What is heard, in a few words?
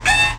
Alien siren